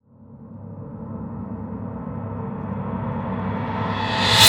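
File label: PP String Strike, reverse, Cinematic Metal Impacts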